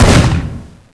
shot; MAXOVER

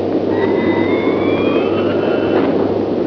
GEAR; VIETNAM

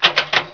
BAND

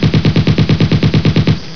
MINIGUN

